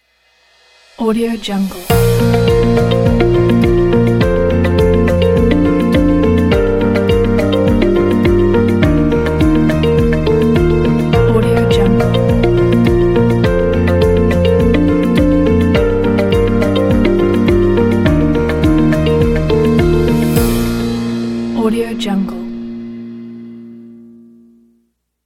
uplifting ident

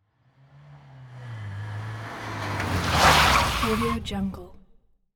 car brakes screeching

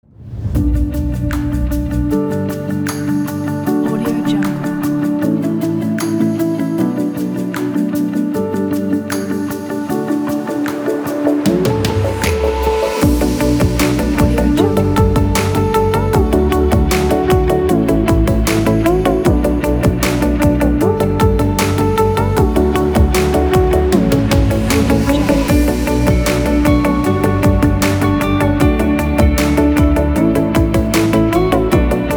future indie pop

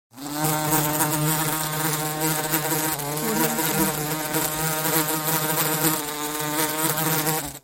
bee; pure-noise

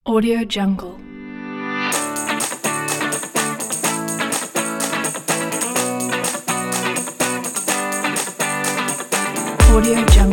summer pop

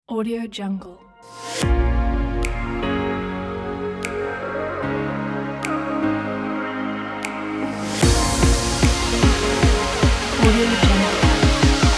uplifting future bass

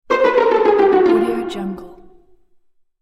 cartoon game ending

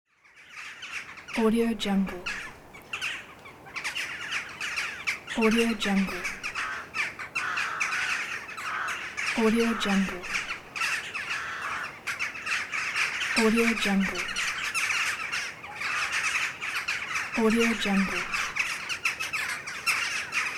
flock of crows

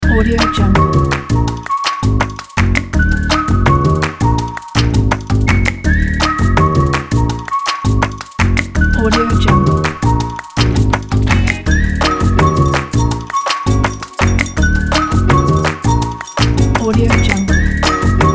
jazz upbeat fashion

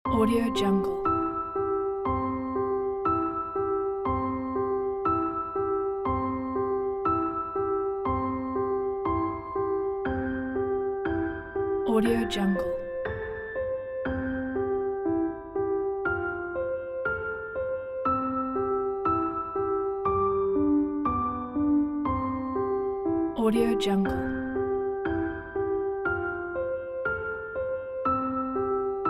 twinkle twinkle little star

